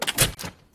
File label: Disconnect Lung Device; Lethal Company